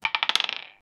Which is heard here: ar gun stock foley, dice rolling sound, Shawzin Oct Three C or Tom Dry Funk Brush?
dice rolling sound